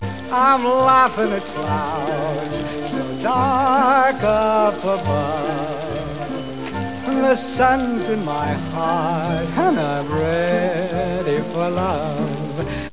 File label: singin